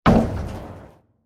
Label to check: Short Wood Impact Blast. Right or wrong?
Right